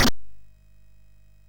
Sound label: sid MOS6581R2, GAMES; Catalypse